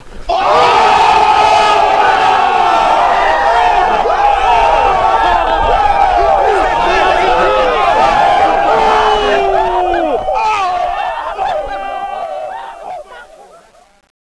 rap battle oooh; prop hunt x originals

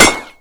hit, crossbow

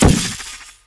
portal gun fire blue; weapons

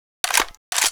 bren bolt pull; mkbren

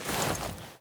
weapon movement walk; weapons

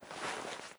weapon movement; weapons

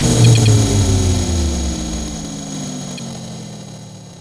portalgun powerdown; weapons

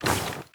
weapon movement sprint; weapons

